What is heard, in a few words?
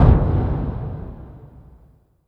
IMPLODE, PERC; NorthStar - The Wizard VOL-2; TSAR PERC